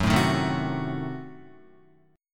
F sharp Diminished 7th F sharp Guitar; strummed; JGuitar

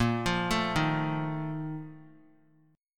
Bb Major 7th Suspended 4th, chord; arpeggiated; JGuitar

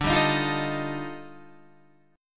D sharp Major Sharp 11th, D

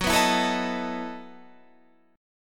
E Major 7th Suspended 2nd Suspended 4th

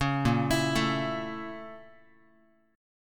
A sharp Minor Major 7th Flat 5th A sharp Guitar; A#mM7b5 arpeggiated; JGuitar

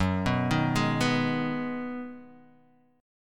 B 6th F sharp, guitar chord

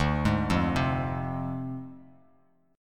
D Minor 7th Flat 5th Bass Standard; Dm7b5; arpeggiated

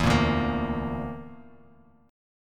D Suspended 2nd Sharp 5th D, Bass Standard; Dsus2#5; strummed; JGuitar

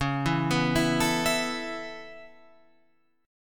C sharp Minor 7th Sharp 5th C sharp Guitar Open F; C#m7#5; arpeggiated; JGuitar

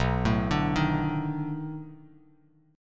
B 7th Sharp 9th, down, Bass Standard; arpeggiated

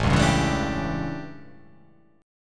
A 11th down, guitar chordshape; strummed

